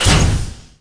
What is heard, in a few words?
LIFT STOP; Messiah